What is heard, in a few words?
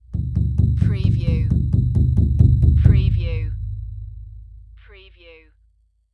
Cartoon Drum Bass Pound